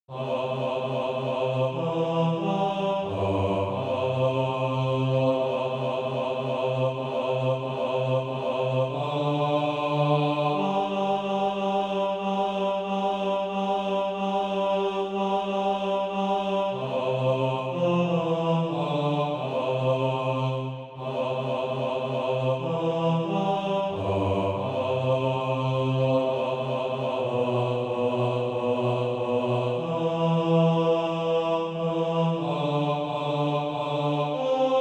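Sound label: bas, Practise Music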